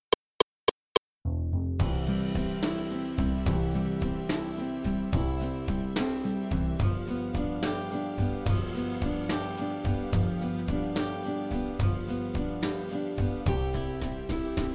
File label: Piano